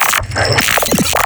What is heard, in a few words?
Glitch